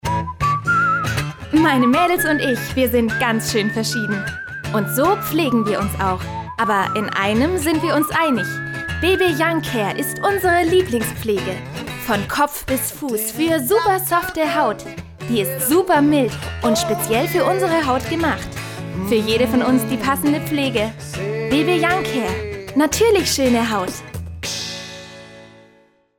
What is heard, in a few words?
Bebe